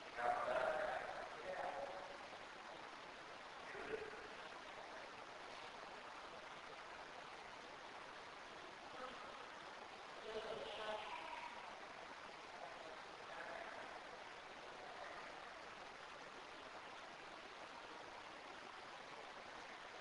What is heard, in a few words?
Lobby unknown; Case 012 Pocahontas Fuel Building 1st Floor